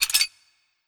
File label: Spider Mecha; Legend of Keepers